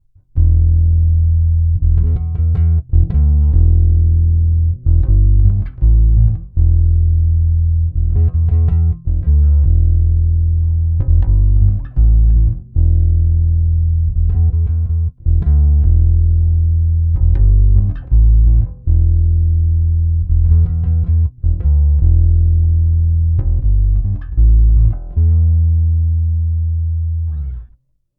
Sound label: Bass boost treble cut